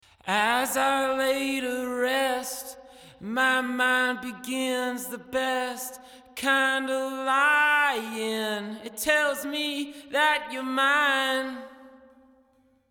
chant avec effet long dark; psp nexcellence

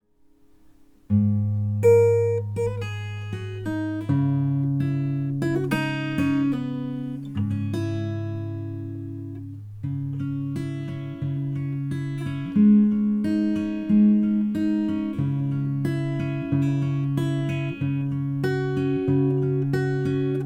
guitare arpege; lct 140 air

